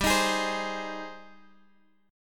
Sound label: Ab Major 7th G